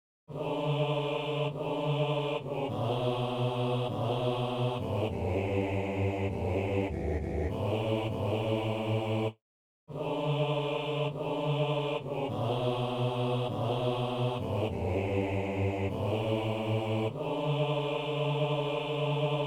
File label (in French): hommes; Ces mots (P.U.)